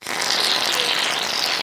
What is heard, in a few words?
Mousse Spray Hair